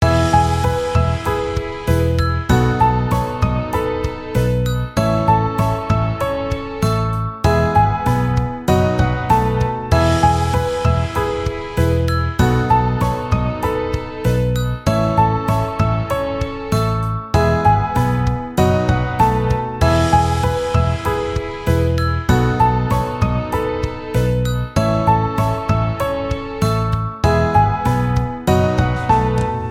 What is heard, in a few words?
Chime